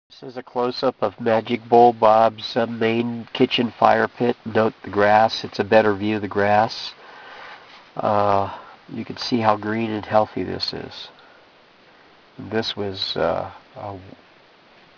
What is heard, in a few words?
Magic Bowl